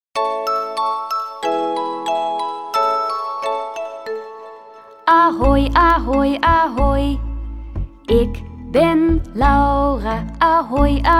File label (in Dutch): Ahoy ahoy ahoy begeleiding met zang; taalsprong